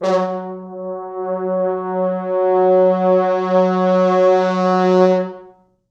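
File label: 4 French Horns, Horns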